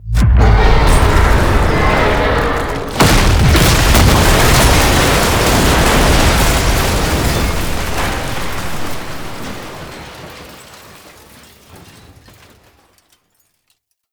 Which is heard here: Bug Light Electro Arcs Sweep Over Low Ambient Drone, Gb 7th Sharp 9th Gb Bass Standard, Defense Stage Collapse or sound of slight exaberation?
Defense Stage Collapse